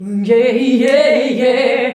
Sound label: VOX, Singin